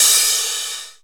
FX Cymbals, Percussion; Dance Synths

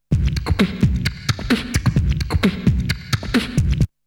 Drumloop; ZERO G - Datafile DISC 2

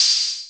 ELECTRO CY; E-MU Formula 4000 Series Vol. 5; Protozoa; Proteus1 Instrmt

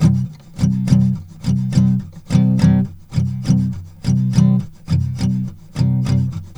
AC C#3 L, guitars; Spectrasonics; Hans Zimmer Guitars VOL-1

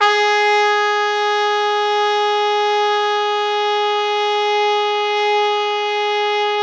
G#3, Pop Brass; Best Service ProSamples vol.19; AKAI

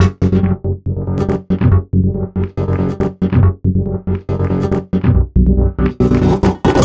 Envelope Beat; Drums & Drones

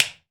finger pops; AKAI S-Series CD-ROM Sound Library VOL-5

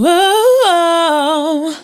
WO WOH, Female Vocals; PlatinumPhattCD2; E-MU Producer Series Vol. 8 – Platinum Phatt

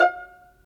Vas Pizz; STR Vlas Pizz

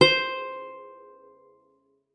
GUITAR C5; Xsample VOL-2

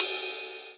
Ride Cymbal

